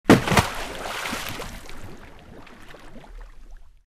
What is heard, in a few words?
Water drop